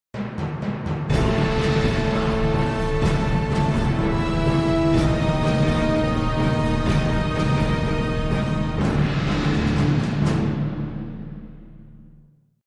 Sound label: Bad team victory; Age of Chivalry; Windows